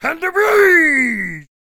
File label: King Attack Vocals